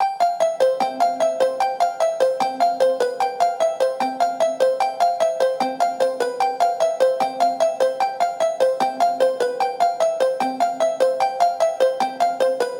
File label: Future Bass Arp Loop 150 BPM A Min, Synths